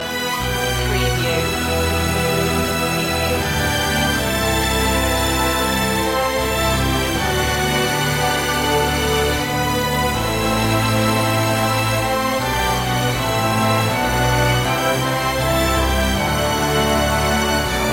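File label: no choir percussion synth or guitar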